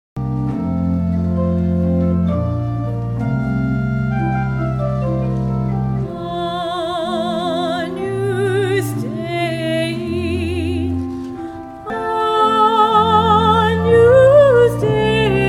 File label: Soprano Agnus Dei Coronation Mass; Mozart